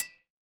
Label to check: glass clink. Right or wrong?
Right